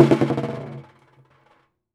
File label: Prepared Drums